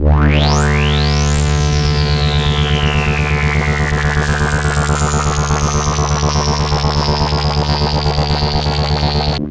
Roland C D2, Roland S100; musicradar-synth-samples

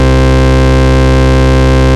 Ultimate Bass Root C; Vengeance Samples

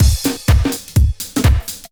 drm 125, drumloops percpattern; house; PS-28 Vocal House Samples

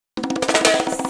Fill 128 BPM; Vengeance Samples; VEH1 Loops

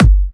Kick 140BPM; G minor; Drums & Perc; Vengeance Samples; Space Of Time Kit

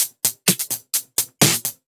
Electro Loop 128BPM; Vengeance Samples

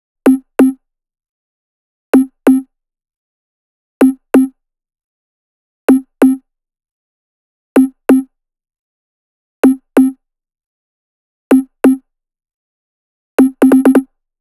Melo 128BPM; Mini Kits

